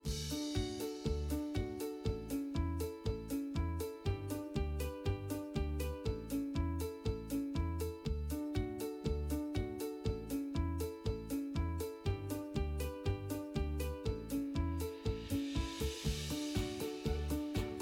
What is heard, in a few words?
music cheerful; bgm